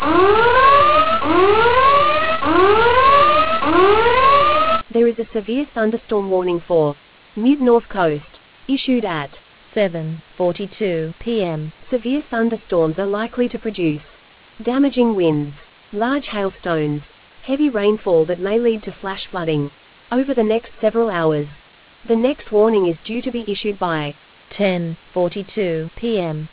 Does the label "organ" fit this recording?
No